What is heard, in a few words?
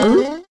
slide